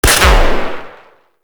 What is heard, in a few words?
Sentient Pistol Shot; Warframe; Amalg